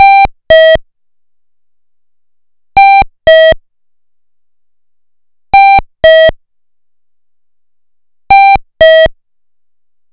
Alternating chime, combined signal; Alternating tone (two tone chime); pfannenberg; signaling-technology; combined-signaling

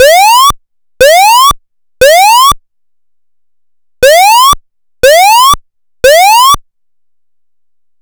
Pulsating tone, Australian alert AS1670, ISO 8201; pfannenberg; signaling-technology; combined-signaling

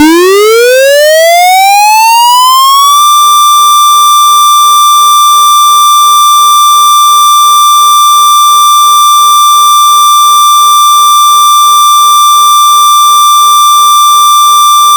Siren, combined signal; pfannenberg; signaling-technology; combined-signaling

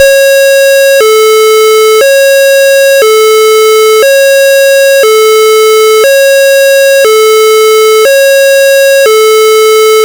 Alternating Sweden, Sweden SS031711; Alternating tone; pfannenberg; signaling-technology; combined-signaling

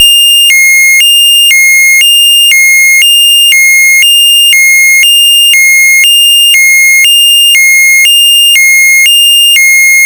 Alternating, combined signal; Alternating tone; pfannenberg; signaling-technology; combined-signaling

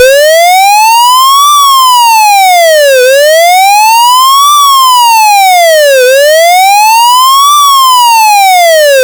Sweeping, combined signal; pfannenberg; signaling-technology; combined-signaling

